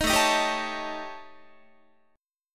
A 7th Flat 5th A down Guitar 4A,4E,5A,5D,5G sharp,6C sharp; A7b5; strummed